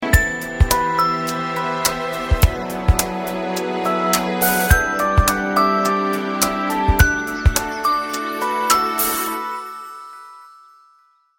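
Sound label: nokia n8 ringtone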